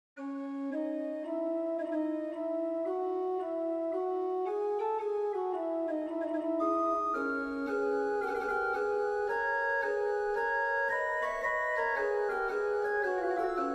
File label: Magnificat Trio, organ; Dandrieu; roquemaure